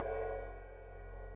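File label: ride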